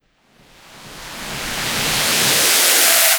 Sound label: Riser W Noise Rise